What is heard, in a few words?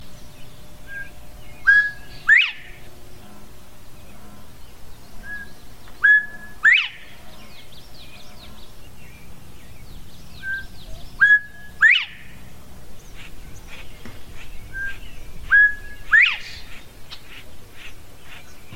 quail; animals